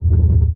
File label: bottom bump; Blind Drive